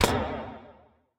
Defeat Bow; smite; god cupid skin13

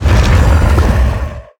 roll base; smite; god geb skin7

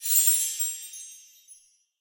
heal; Smite; Baron Samedi

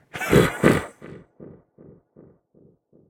heh heh; smite; jorm

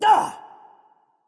grunt hit; smite; voicepack; hou yi

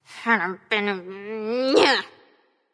grumble; smite; persephone

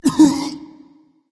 grunt hit berserk; cuchulainn

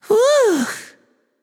sigh, voicepack; smite; jorm

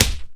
minion melee damage slap; smite; npc; roman archer

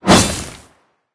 sword out of; smite; odin

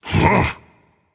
confused rage; chaac

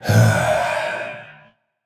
sigh; smite; arthur

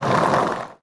skateboard land roll; smite; mercury

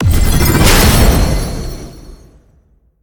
activate; Smite; Tiamat

